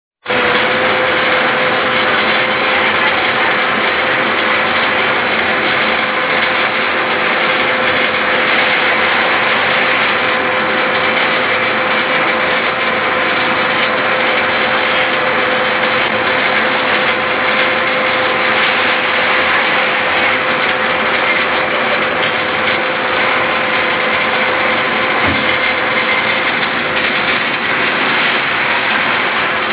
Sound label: engine Room